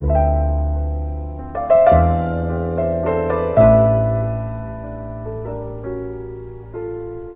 piano, sad

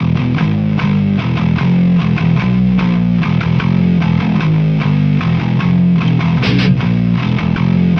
bass, Loops Sad

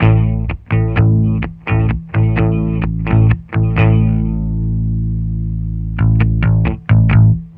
bass, Loops Phonky

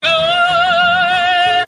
"oh" SUNG; ooh ahh

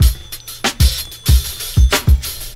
key jangler, funky drum loops

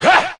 "heh"; Voice; ooh ahh

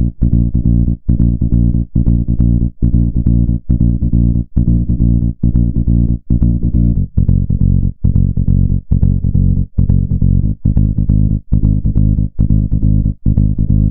keys, Sad Loops

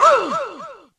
"oh"; ooh ahh

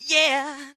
"yeah", sung

